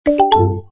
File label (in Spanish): notificacion Messenger